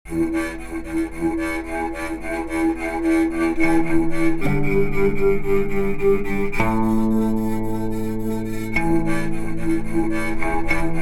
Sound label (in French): Triboîtophone improvisation